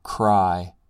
cry

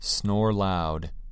snore loud

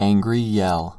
angry yell

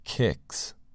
kicks

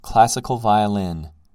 classical violin

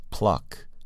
pluck

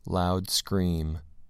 loud scream

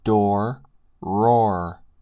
door roar

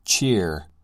cheer

